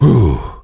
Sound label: whew; Duke Nukem; Manhattan Project